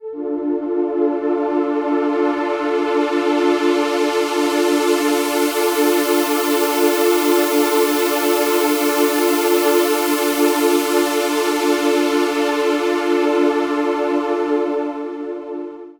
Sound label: Pad, Trance